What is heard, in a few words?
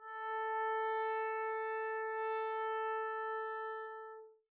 arco a4 p up, double bass; dsmolken